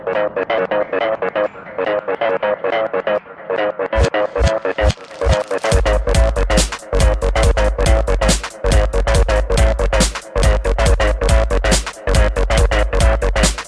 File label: dubstep, ringtones; electronic